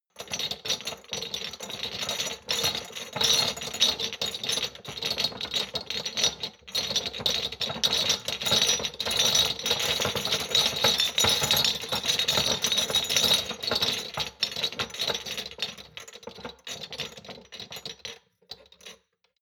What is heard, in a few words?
shot glasses rattle soft, gastronomie; berufe; soundpool